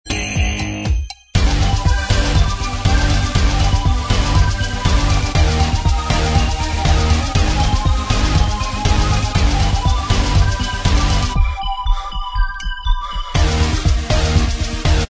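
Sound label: instrumental track, backing tracks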